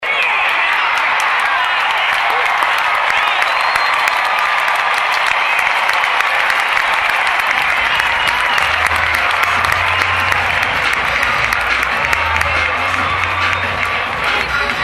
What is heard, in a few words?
Big Cheers